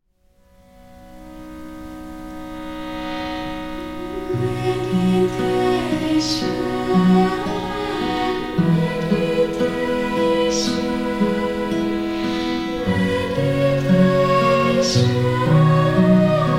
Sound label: Meditation